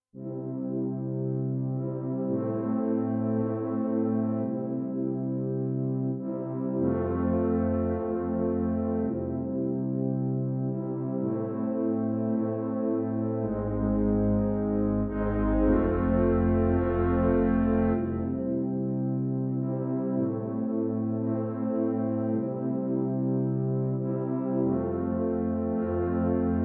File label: Horns, XV-88